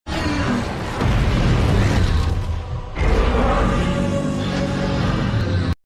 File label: pain roar; godzilla